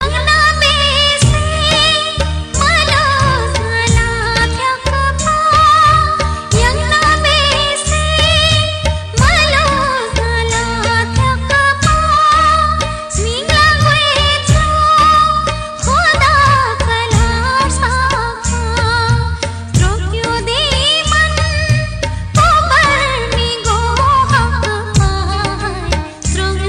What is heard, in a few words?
Baltistani Tune